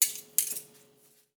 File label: knives; nova sound